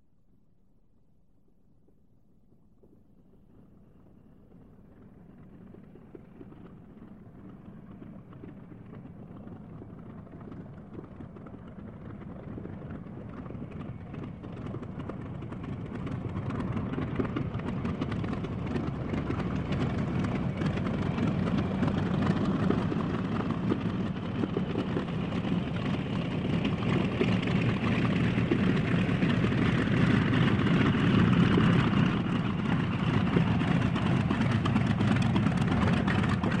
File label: tractor, Rumbles